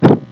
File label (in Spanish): Golpe